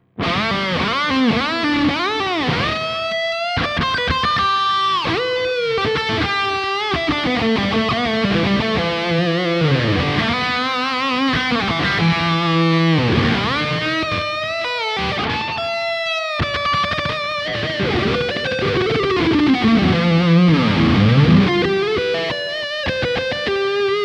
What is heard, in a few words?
Fusion T HH Humbucker Highgain Lead; Harley Benton